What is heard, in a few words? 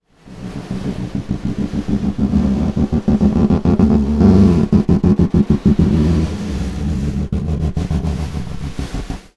Snare Drum; Percussion Samples